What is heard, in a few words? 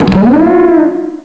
Cry; Pokemon Essentials; Pokemon Iberia V1.03